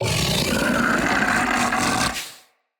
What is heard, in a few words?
Kubrow Feral Vocal Alerted, Enemies